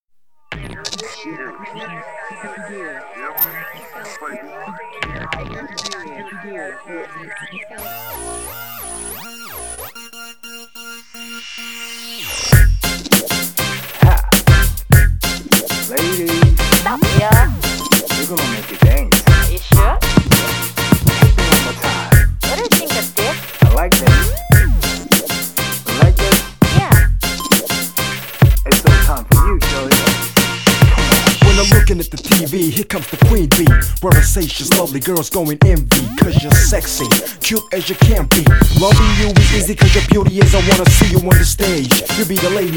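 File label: prologue drum and bass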